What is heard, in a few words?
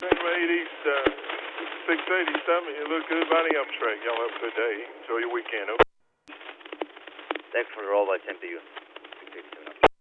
Roll By